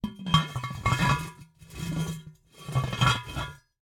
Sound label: Cookie Jar, ceramic, glazed, irregular shape, hollow base, hollow top, rough, resonant, tonal, close; adjust, open; Eiravaein Works; Vaeyan IV